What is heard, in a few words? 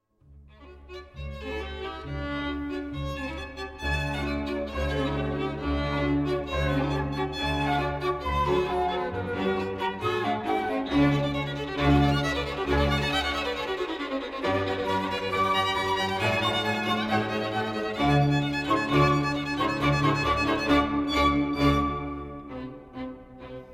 quartet sample